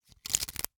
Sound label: Hwatu, card game, hard plastic, small, clatter, stack, drop, tight; Eiravaein Works; Vaeyan IV